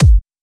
kick dubstep

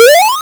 blip, 8bit

